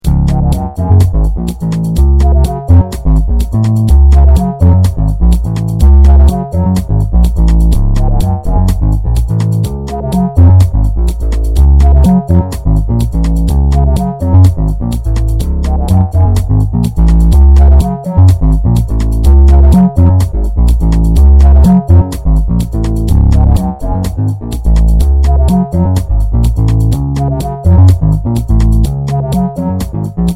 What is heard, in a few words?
boop n groove